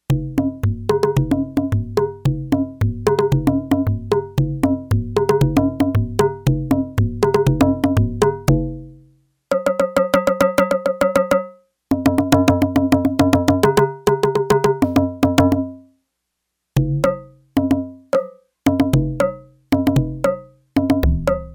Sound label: Conga Drum, Yamaha TX802; Factory Patches; Voices